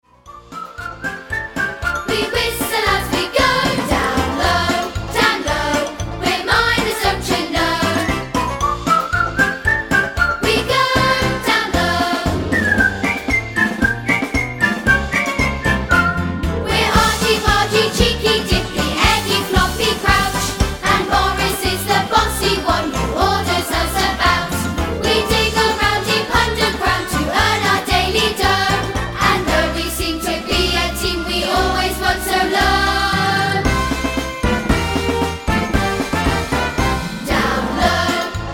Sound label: Down Low, vox